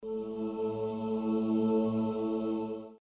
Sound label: long pad short